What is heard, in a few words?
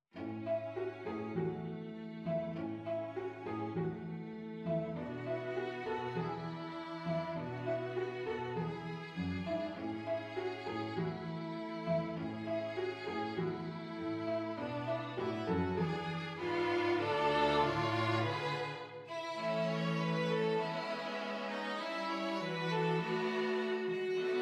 Jingle Bells arr. for String Quartet; Ilan Chouraki